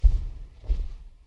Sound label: walk; undying; Five Nights at Treasure Island 2020 Remake; windows